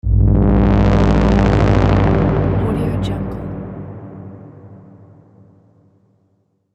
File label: crunchy deep transition